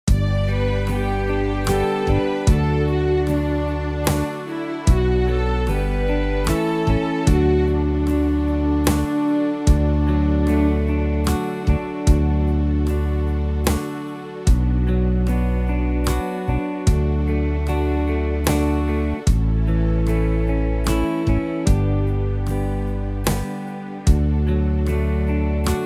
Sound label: backing; barbara allen; folk